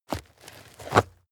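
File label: step dirt heavy boots scuff hard, footsteps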